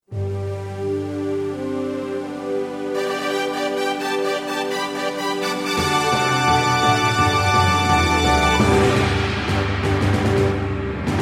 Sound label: Opening Instrumental Version; DBZ2; Bola de drac